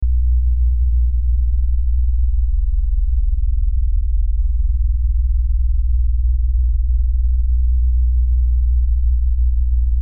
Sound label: Lfe Drone Low Bass Rumble; Sound Design